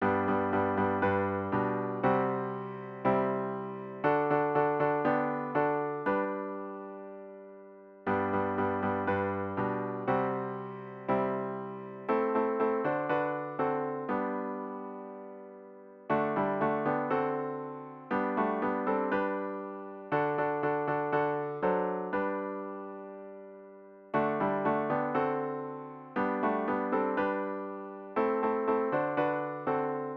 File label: midi